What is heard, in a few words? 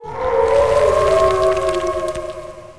death